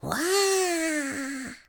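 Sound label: ambience China 3D ambience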